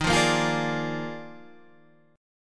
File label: Eb Major 7th Suspended 2nd Suspended 4th Eb Guitar Standard; strummed; EbM7sus2sus4